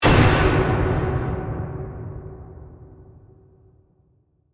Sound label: Hit Metal